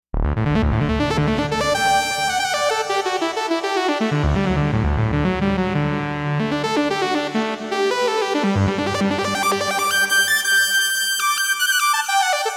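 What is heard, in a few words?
solo saw lead; Solo Saw Ld; JUNO-Di Patch Demo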